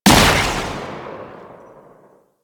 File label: Railgun Fire; Weapons; Warframe; Archwing